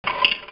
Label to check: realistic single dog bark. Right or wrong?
Wrong